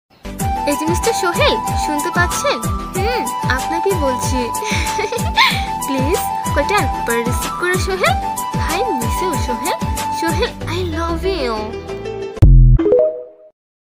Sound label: bangla ringtone